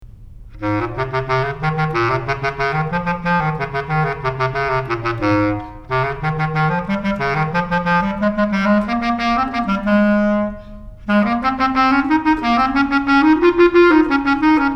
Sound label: Bass Clarinet Junior High